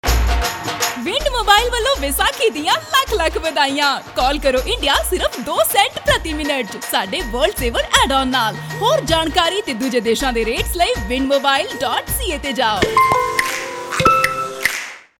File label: full dhol beats; visakhi; Wind Mobile